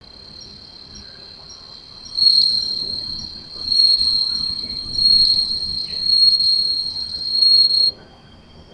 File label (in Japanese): suzumushi